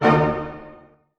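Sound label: A minor pianissimo, orchestra hits; Sound On Sound - Peter Siedleczek's Orchestra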